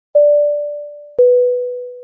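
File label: plane announcement ping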